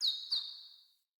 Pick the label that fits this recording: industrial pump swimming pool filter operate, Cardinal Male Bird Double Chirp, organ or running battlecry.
Cardinal Male Bird Double Chirp